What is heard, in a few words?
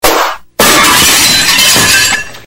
Broken glass